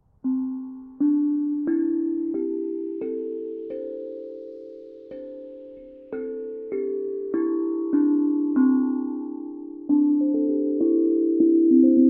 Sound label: Small Babel Drum